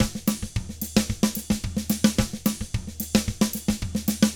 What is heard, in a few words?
SMBA, 110 SAMBA, Drums; ILIO; Double Platinum Drums 1